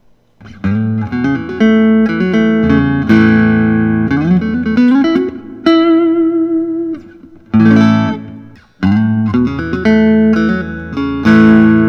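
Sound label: forshage gt cedar flame maple; shoreline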